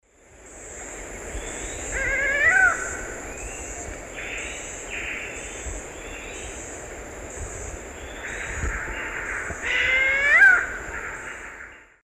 Leptodon cayanensis, call; S. America; Eastern Lowlands

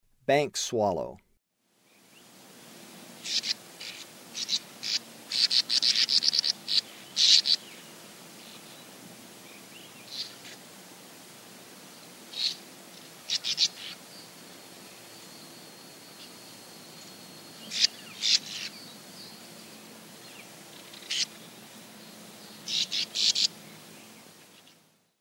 Bank Swallow; S. America; Eastern Lowlands

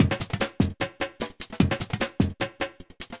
phewstep 150

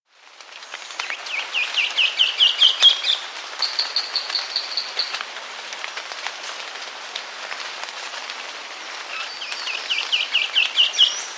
Rufous Gnateater; S. America

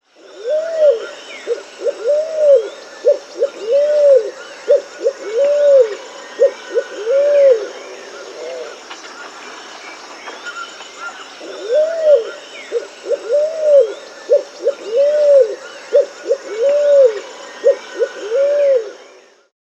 Pale-vented Pigeon (Columba cayennensis), Peru; S. America

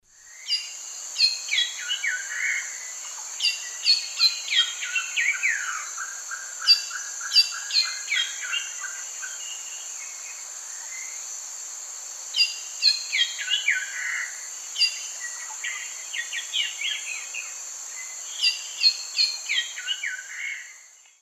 Saltator coerulescens, alternate song; S. America; Eastern Lowlands

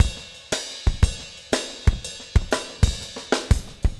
motown 120, loops, 120bpm

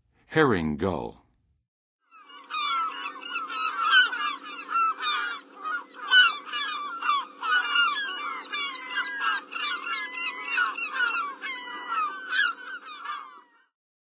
Herring Gull, Birds of North America; Western USA; Western USA Songbirds

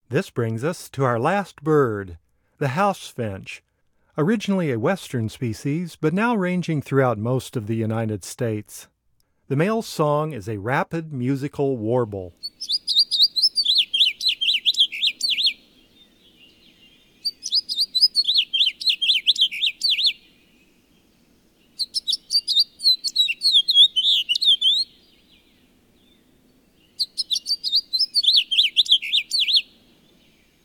House Finch; North America; Common Western Species; Know Your Bird Sounds

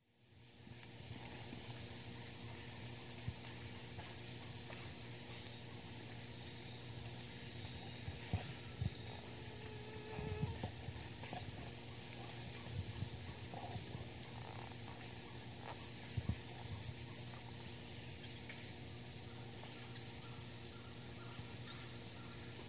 Dacnis albiventris, calls and song; S. America; Eastern Lowlands

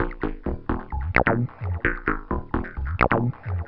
Synth, Techno

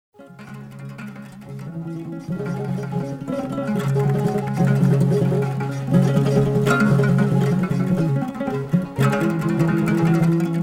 Desert Blues

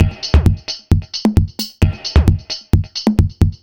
Complete Loop, Dance Beats

